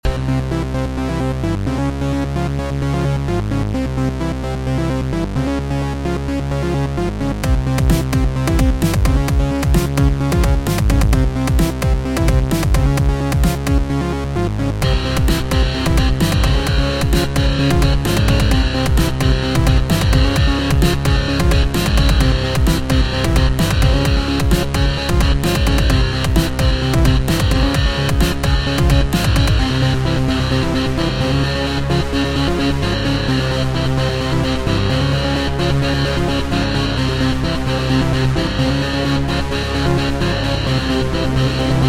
music hard level

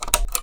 toggle switch, pocket calculator; pocket calculator 24

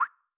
menu select

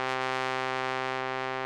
tenor trombone C3

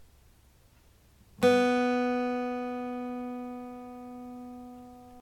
2nd String B

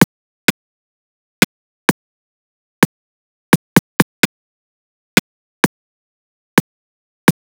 hiphop drum loop

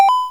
coin

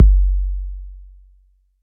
kick, 808; 808 aristocrat

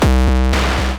bass drum, pocket calculator; pocket calculator 24

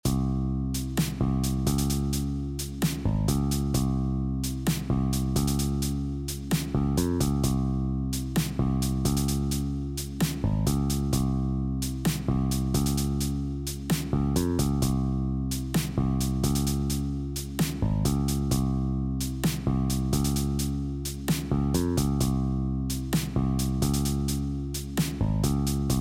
music easy level

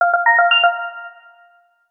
ding

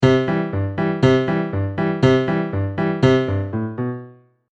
piano

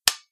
switch

